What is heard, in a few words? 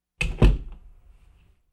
Kitchen Drawer close